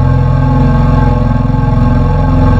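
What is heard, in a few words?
Chill Table, Wavetables; Synthetic's Serum Collection; Cymatics - Chill Tables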